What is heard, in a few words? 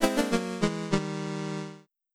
gameover